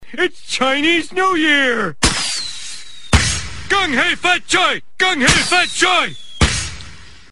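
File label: chinese new year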